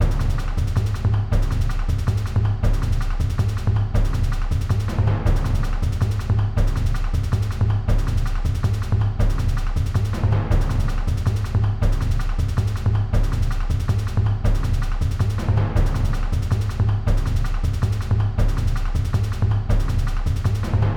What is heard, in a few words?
Trap - Escape; Darkest of Days; xbox 360